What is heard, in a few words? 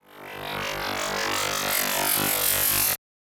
Mid Robotic Charge up; SoundsCrate